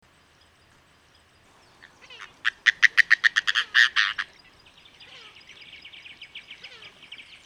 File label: Darter